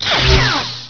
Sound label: knife hit wall, lightsaber